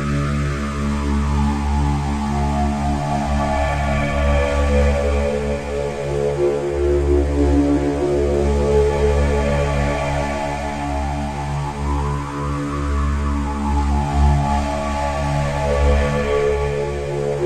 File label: PAD